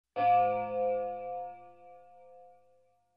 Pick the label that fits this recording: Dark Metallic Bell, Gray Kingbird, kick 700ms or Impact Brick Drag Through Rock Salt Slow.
Dark Metallic Bell